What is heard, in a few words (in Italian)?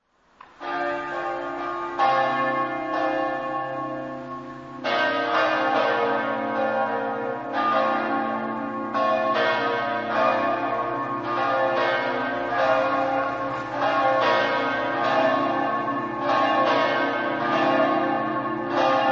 campane; gemona